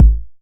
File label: 909 BD; Goldbaby Tape808 & Tape909; The Tape 909